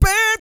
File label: SING, Vocal Planet R&B & Dance; ILIO